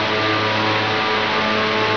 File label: machine hum; environ; Gunlok